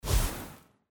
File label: wizard fire attack